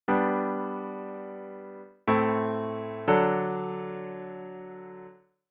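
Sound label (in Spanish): Semicadencia Ii a V, G; auditivo de cadencias autenticas plagales rotas y semicadencias; Identificar la cadencia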